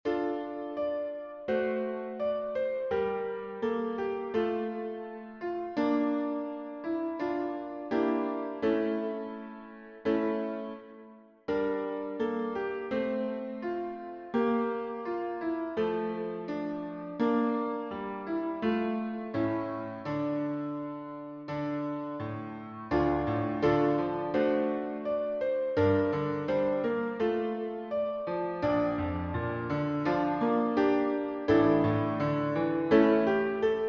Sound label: piano; zore moya vechirnyaya